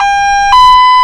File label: hilo, sirens; federal sig ss